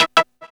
PULSE E; AMG; Global Trance Mission VOL-1

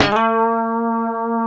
B 3, COMPR STRAT, Guitars; Spectrasonics; Hans Zimmer Guitars VOL-1; 90's Sample CDs

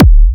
Hard Kompressor, Kick Drums; Zero-G; Soundclash

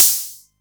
HatO, Roland MC09; Drums

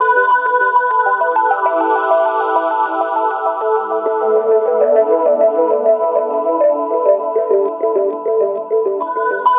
E Phrase Dark Echoes; Zero-G; Future Wave

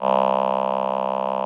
VP-330, String Machines

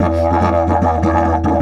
150, DIDGE RIFFS

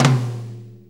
6-8 Tom Flam; Drum Tools; Sonic Foundry (Sony Creative Software); Loops & Samples Drum Tools; Flams

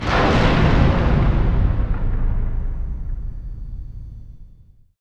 STR SNAP, String FX; Roland - LA Composer vol. 2

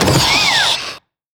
Mind The Hamster, Drum&Bass Hits & Bits; Total Drum&Bass; 90 s Sample CDs

